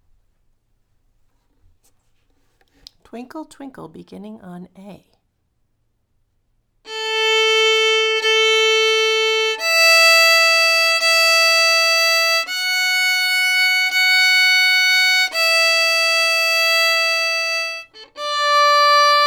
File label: Twinkle A Major